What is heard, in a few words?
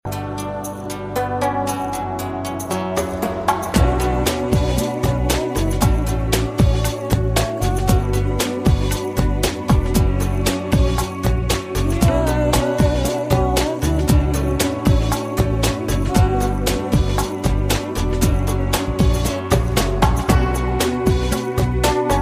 new age hit ringtone